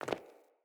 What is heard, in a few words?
Sandals Land Default, Footsteps; Player